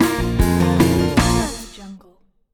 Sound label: country rock win